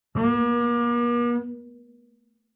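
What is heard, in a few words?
Bass arco fortissimo sul D Bb3, Double Bass; MIS Pitches - 2014; Strings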